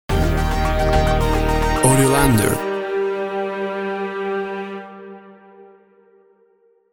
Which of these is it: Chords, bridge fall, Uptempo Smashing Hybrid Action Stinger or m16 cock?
Uptempo Smashing Hybrid Action Stinger